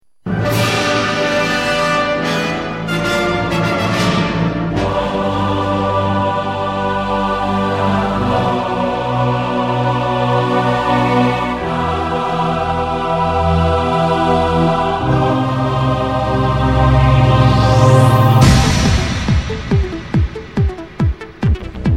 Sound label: music remix; starwars; alternative